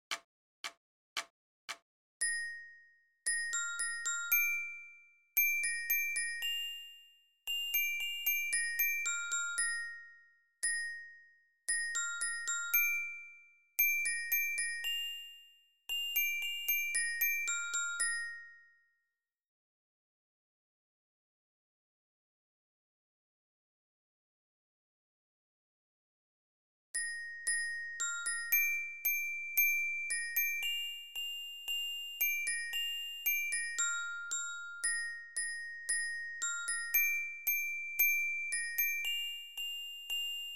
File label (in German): Lyra 1, Spielmannszug